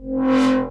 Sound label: Synth C, Synth Prophet One Shots; Electronisounds